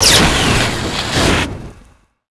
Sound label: pipe